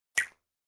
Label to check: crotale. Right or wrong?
Wrong